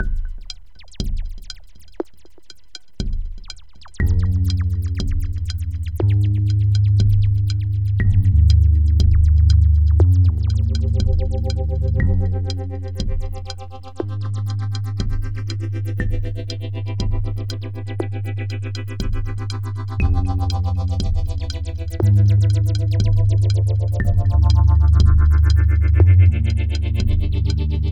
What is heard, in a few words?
chirpchirp; twentilos